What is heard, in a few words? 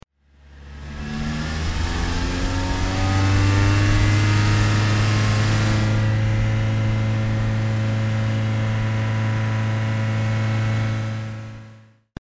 Sports Car Driving Med